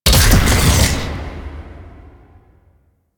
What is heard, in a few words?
Layer Alt Shot C, Shotgun; Phantasma Prime; Revenant